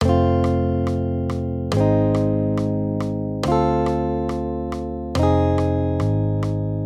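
Guitar, Accompaniment, Major Triads, F-Ab, 140 BPM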